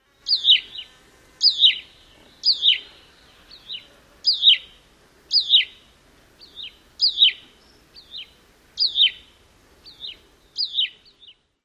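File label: song, bird; AZ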